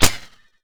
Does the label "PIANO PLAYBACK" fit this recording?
No